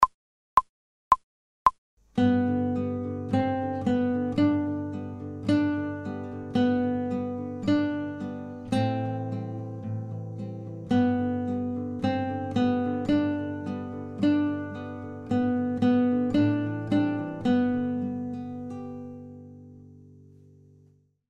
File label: 2nd String Review fast, guitar; without metronome